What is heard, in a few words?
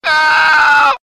ow, Voice ooh ahh ow ouch